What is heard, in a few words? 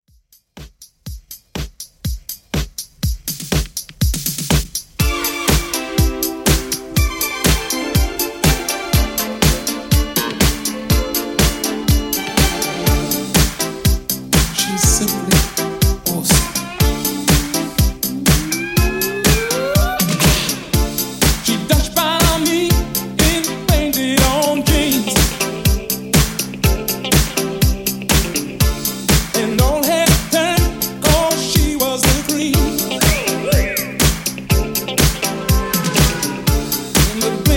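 80s Extended Redrum 122Bpm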